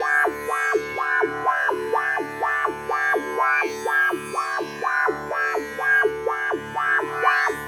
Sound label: ethno, 125, A